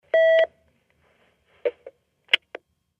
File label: Intercom Beep Line Ha, futz intercoms